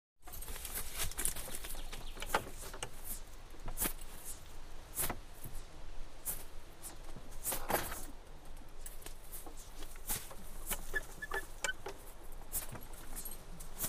Carriage Idle 2 Drive

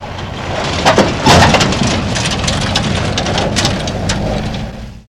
Car Over Bump Thwack; hits & rattles

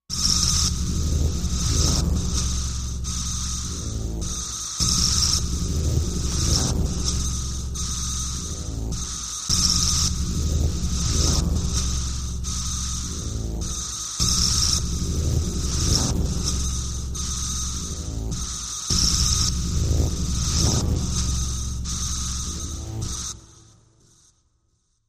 Transistor Engine, energy beams

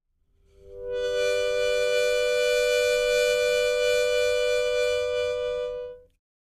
Mouth Organ Soft Fina, harmonica; woodwinds

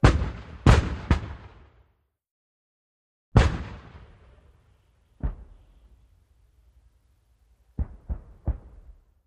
Mortars: Firing And Impact, Distant

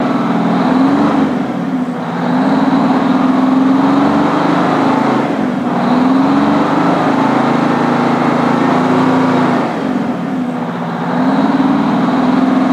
Truck, highwayman

